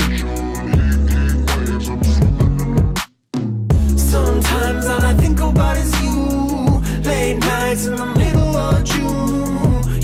Pop Music

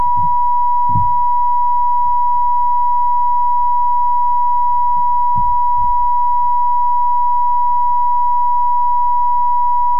calib, Teatro dell'Aquila

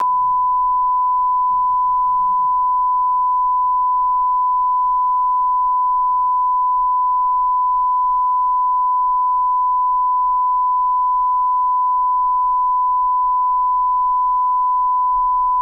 Calibration 94dB; Bedrock

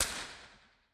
Scala Surround Right

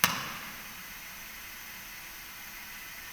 Omni IR MLS18; Impulse-Responses

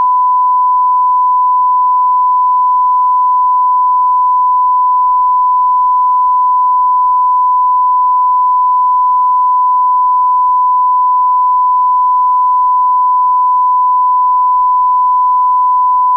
Calibration 94dB; STI

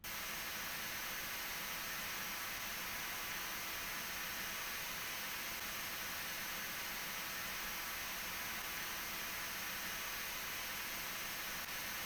Omni MLS; Impulse-Responses

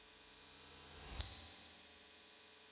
Inverse IR Sweep; Bformat

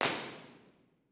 Dodec IR, Teatro Municipale Piacenza

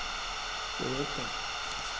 Signal Noise

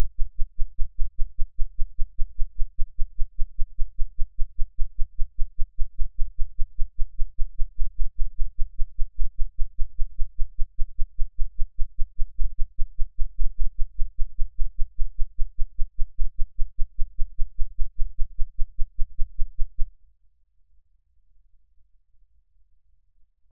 burst 32ms 1kHz; Hilbert; Mic1 guida; CESI; LaSpezia

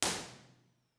IR Sweep

